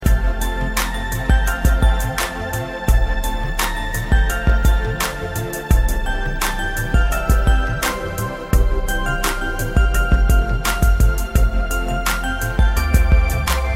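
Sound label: Valentines Instrumental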